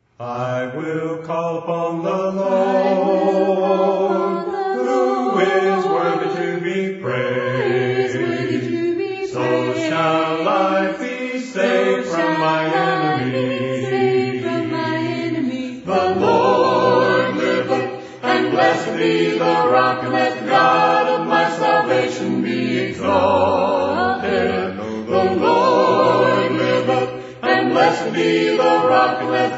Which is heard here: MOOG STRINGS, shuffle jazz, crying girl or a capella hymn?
a capella hymn